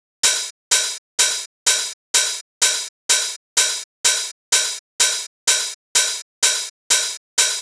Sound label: Drum Loop Open Hat Loop 126 C Open Hat